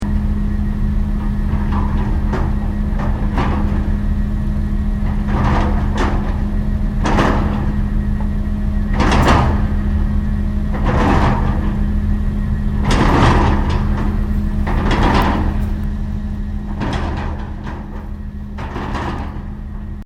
Construction Site Drilling Rig Setup, Japanese construction drill site; SoundEffects Foundation2